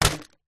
office felt tip pens pencils other stationery in plastic tub set down soft surface; zapsplat